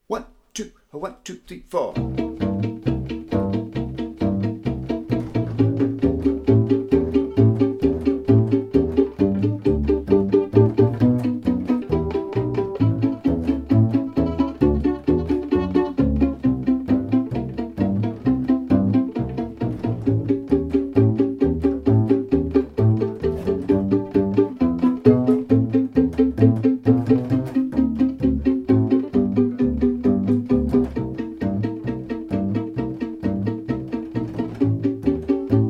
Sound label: backing track; blues en mineur minor blues